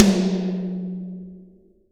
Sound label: TOMS, Real Mega Drums